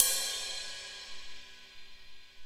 ride sound B key, ride cymbal sound sample tuned to the B note. Loudest frequency: 7806Hz